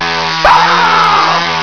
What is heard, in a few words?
ahhh